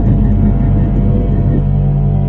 thumper ambient, machines; Garry's Mod; hl2

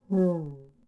Gasmask Fidgt Vox; Sid Meier's Civilization 4 Complete; Beyond the Sword; Units

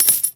coins dropped; Mount&Blade Warband

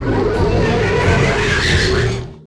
Entity Strike; Sid Meier's Civilization 4 Complete; Beyond the Sword; Units

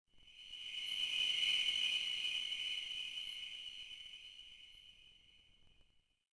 Choir Pad; Ambient; Sid Meier's Civilization 4 Complete; Beyond the Sword

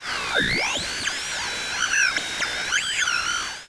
Static; Sid Meier's Civilization 4 Complete; Beyond the Sword; Final Frontier; Units

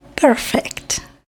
perfect; Frets on Fire

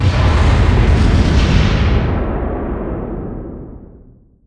distance ships destroyer damage defend; Hearts of Iron IV Death or Dishonor

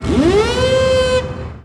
move order fleet; Hearts of Iron IV Death or Dishonor

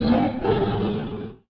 Dread Die; Sid Meier's Civilization 4 Complete; Beyond the Sword; Units

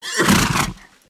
horse snort; Mount&Blade Warband

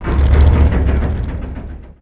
sonic tank motor; Emperor - Battle for Dune

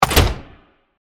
lock; League of Legends; lol air client

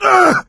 hurt critical, survivor voice; Left 4 Dead 2; coach

